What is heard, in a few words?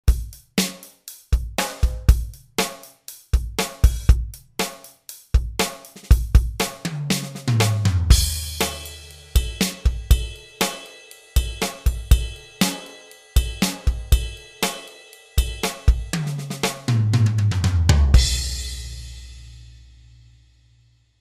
td 20s pop